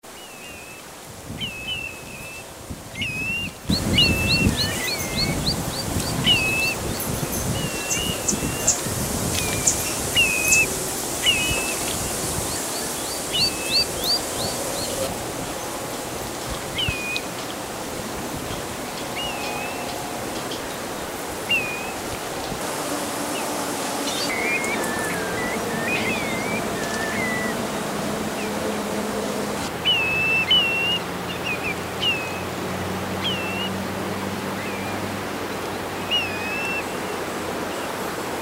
tules golos

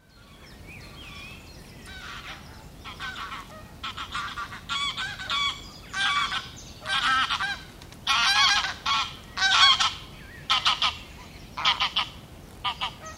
seryy gus golos

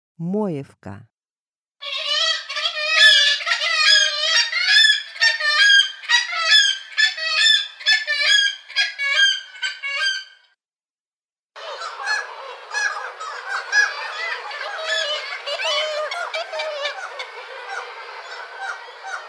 moevka golos

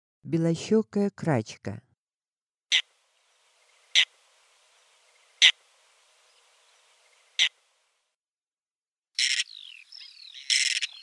beloschekaya krachka golos

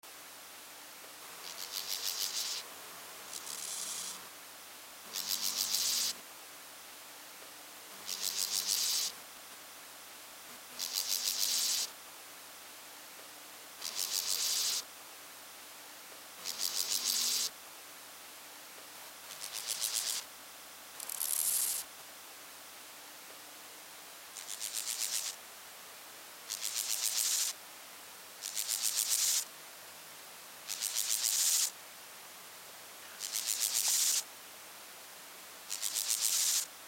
lugovoy konek golos

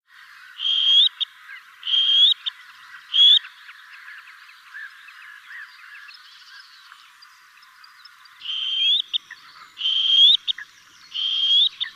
chernosheynaya poganka golos

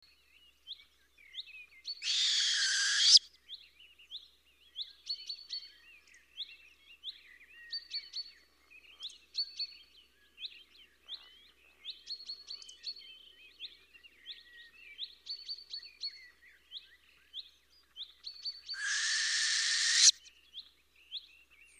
yastrebinaya sova golos

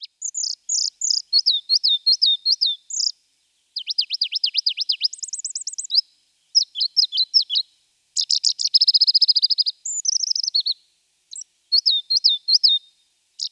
korolkovaya penochka golos

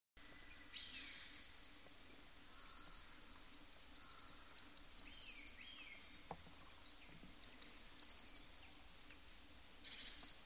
obyknovennaya lazorevka golos

